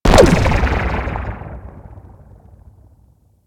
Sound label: Ocean Underwater Mine Explosion; Ambience; Warframe; Grineer Ocean